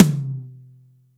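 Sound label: TOM loud; Zero-G; Chemical Beats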